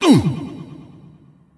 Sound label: Wound; Teron Gorefiend; BLCKTMPLE